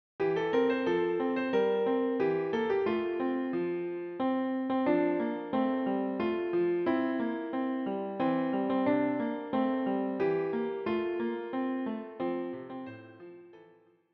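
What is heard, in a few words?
piano; Happy birthday to you - für Klavier; Geburtstagslied für Klavier, inkl. Fingersätze, mit Vorspiel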